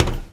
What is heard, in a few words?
footstep metal low walk; Poppy Playtime; SFX Environment ProSoundCollection v1 3 MONO Footsteps